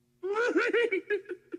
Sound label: texting tone; Yoda